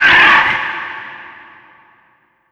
vent screech, headcrab reviver, creatures

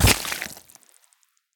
mud impact bullet; physics; surfaces

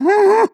warning, zombie vox; creatures

